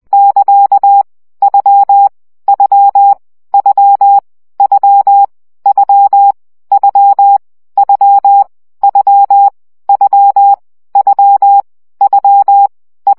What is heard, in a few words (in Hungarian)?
CW gyakorlas u 17 baud